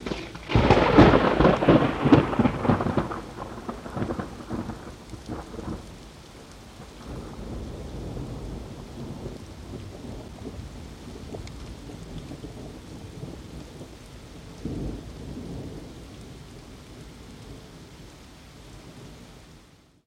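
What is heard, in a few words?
Thunder Crack Small Crack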